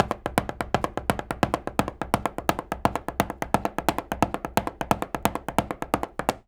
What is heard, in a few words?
133 STEPS, Spanish And Gypsy